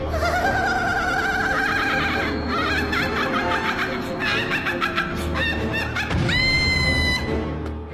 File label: hahahahaha